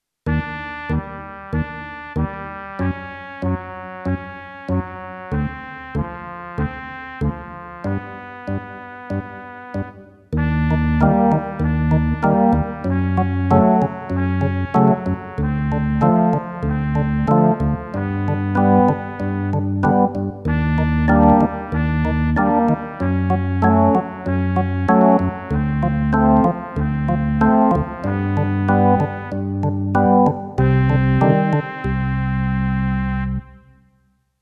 Warm up in Bb; Level B; Warm up 3

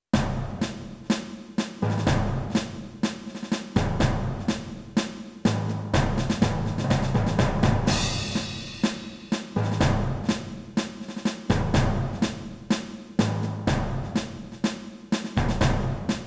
Improvisation

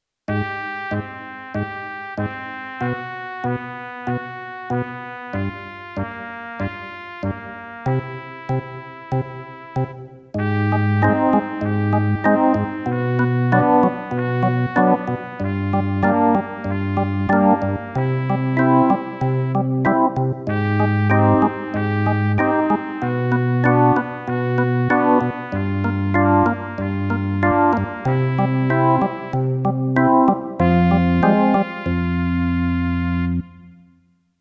Warm up in Eb, brass; Level B; Warm up 3

